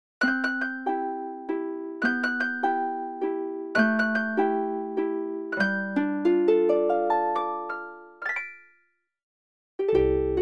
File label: okoto harp; okotori